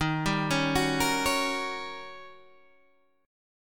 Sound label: D sharp 7th Sharp 9th Guitar DADGAD